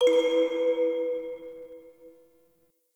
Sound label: Mallet; Warframe; Ambience; Clan Dojo; Dojo Randoms